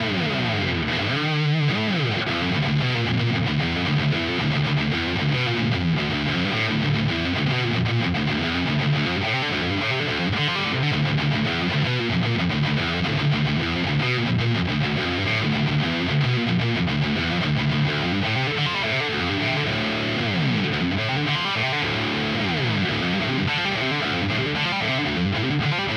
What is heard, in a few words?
Neural DSP Quad Cortex California